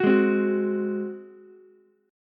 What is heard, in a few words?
F sharp Suspended 2nd Flat 5th, Ukulele; strummed; 11,8,8,9; down